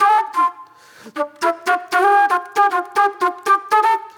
Flute, Disco

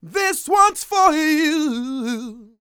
SING, Vocal Planet R&B & Dance; ILIO

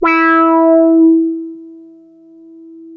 E5, Boom da Bass; E-MU Producer Series Vol. 6 – Analogia Project (CD 1)

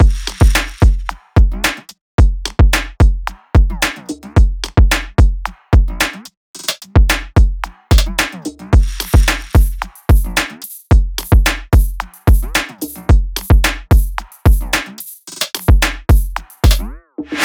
loop full 110; Seismic Loops

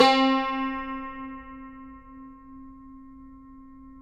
CUMBUS C, Guitars

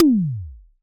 tom, Casio VZ10M Drums; Polyend; Simulacrum Sounds; 1 Shots; Synths

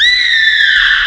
ahh; Amiga Soundtracker Sample Packs

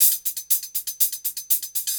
120 HIHAT

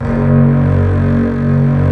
STR STRING, Orchestral Strings

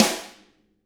RIM; ILIO; Double Platinum Drums 1

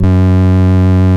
VOX 8CBS F#1, VOX CONTINTL, Vintage Keyboards; Big Fish Audio; First Call Vintage Keyboards

